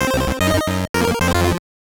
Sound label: Jingle, gb; Player Manager 2001; gbc